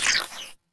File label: vega weapon; fertig classic games